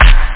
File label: bdrum